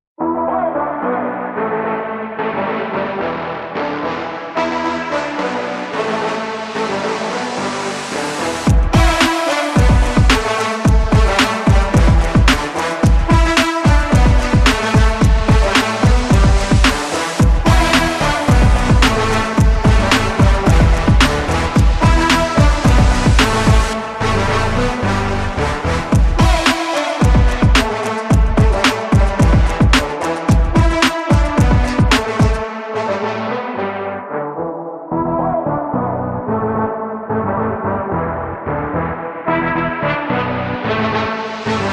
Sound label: energetic royalty free background music for sports and workout videos